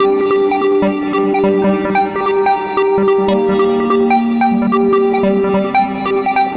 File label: Beneath the Sand, Synth Sequences